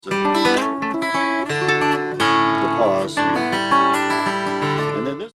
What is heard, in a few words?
Lick